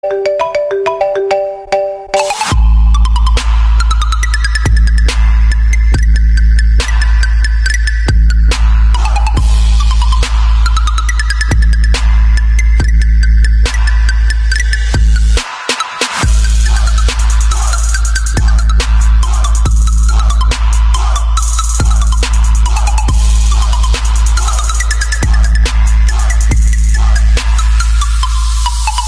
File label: Mozart March; iPhone